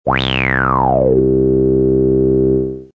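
filter, ENSONIQ SQ-80 Digitally Controlled Filter (with manual Q); Last is the same patch as before, but with high Q.; Hear how the harmonics are almost picked out by the filter.; four pole analogue low pass filter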